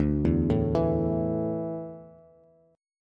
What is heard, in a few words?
D Suspended 2nd D down Bass; arpeggiated